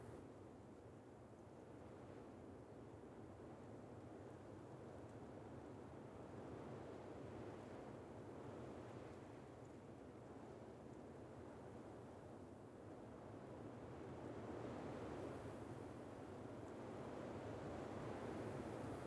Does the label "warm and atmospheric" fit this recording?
No